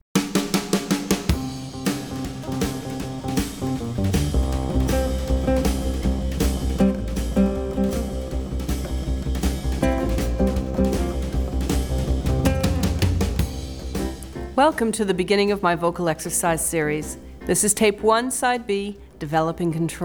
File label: Vocal Exercises